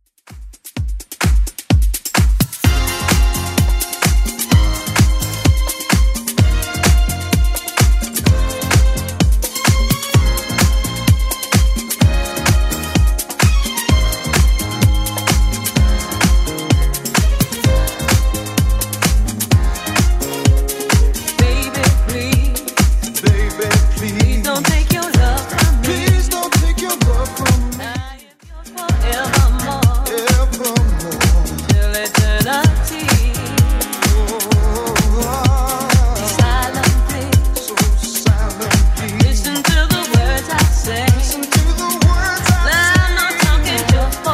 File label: 70s Club 128 bpm